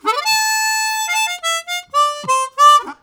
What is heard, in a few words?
Chrom Minor, Harmonica; Zero G